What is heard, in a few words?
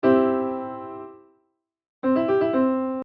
Arpeggio Example